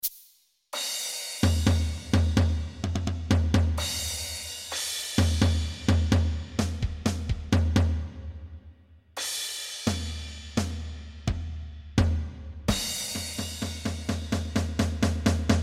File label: Drums